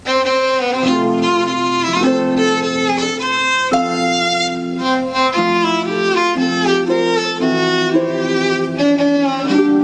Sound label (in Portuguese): violino; Instrumentos